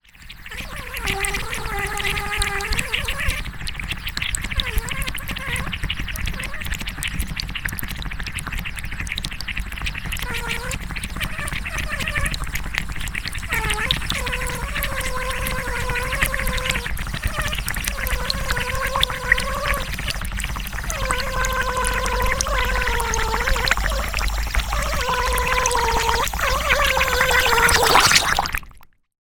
foley balloon deflate release air lip in water bubbles; zapsplat